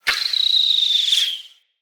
Hunter Bow Ranged Arrow Projectile Start Fully Charged; Weapons; Player; Bows; EnvoyHunterBowRangedMelee